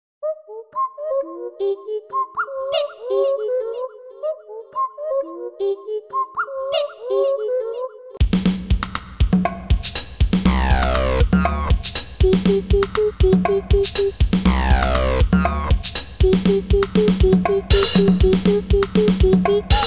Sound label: Groovin Kids, kids kits